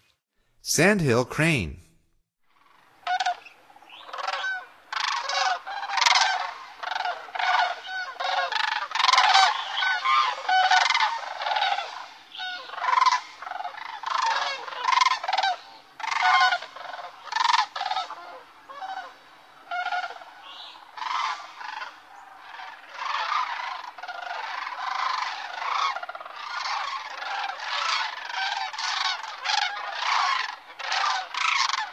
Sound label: Sandhill Crane; North America; Stokes